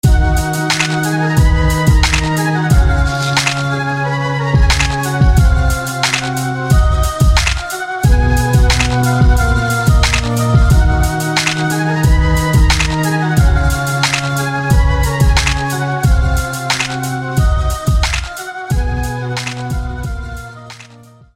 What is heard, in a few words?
Walking Wind